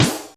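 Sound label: WARP snare drum, effected drum; Crypton - DrumBase5000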